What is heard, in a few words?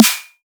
snare plink; drums; base pack